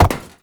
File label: Step Perforated Metal; Movement; Uriel; Warframe